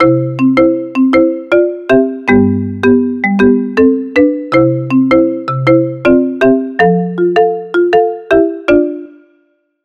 Marimbas; Explay Fresh; ringtones

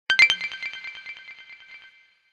Alert Fader High; HTC Touch Pro2

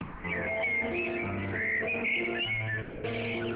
Bird whisper; Audiovox CDM-9900

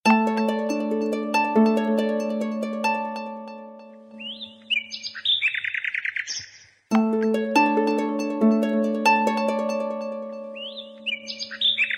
Harp, ringtones; ACE Clever-1

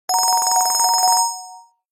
Telegraph, phonetones Nokia 6300i

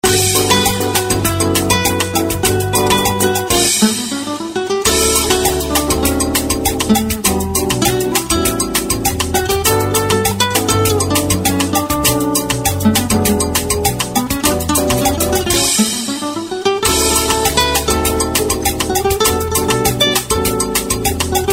Spanish Guitar; Samsung SGH-i200

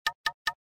Knock

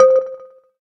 electric, keytone

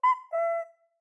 Bird Cicada Notification Day

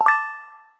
Message Complete; Xiaomi Mi3

